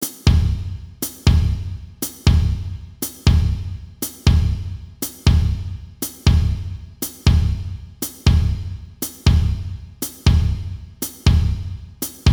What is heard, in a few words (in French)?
Baiao exo 60 bpm